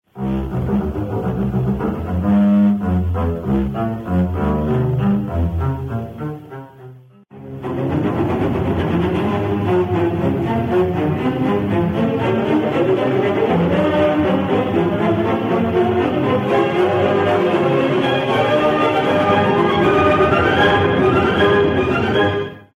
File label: double bass excerpt